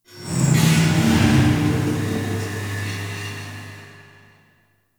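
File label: Large Cargo Room Random Ambience; Warframe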